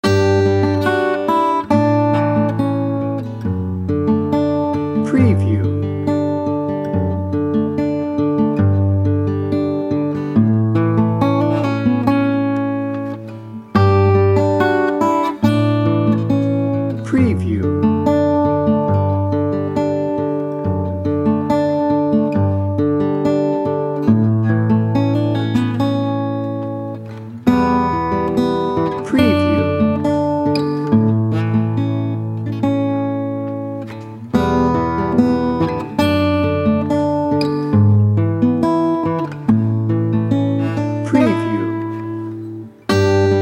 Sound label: tender heart guitar only